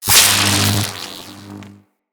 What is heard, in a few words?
Electricity Hit; Impacts